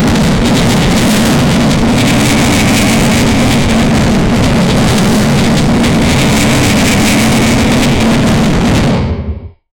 a steampunk engine with, failing

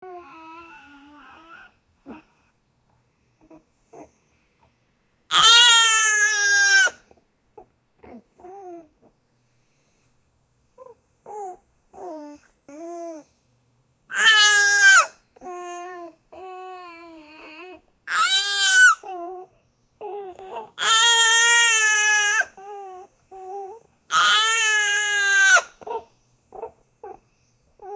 crying of a puppy

